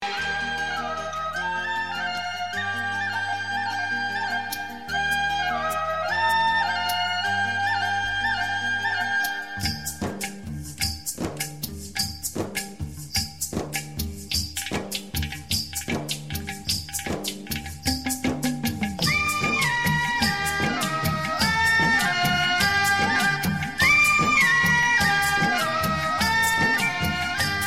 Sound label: typical bollywood